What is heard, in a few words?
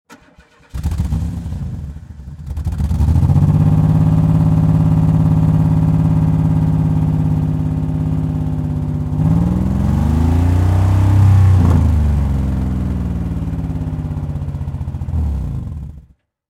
Car Disconnected Spark Plug Start Up Rev Engine Steady Shut Off; Car Sound Effects